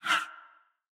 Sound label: Ui Reward Menu Close; portal quest android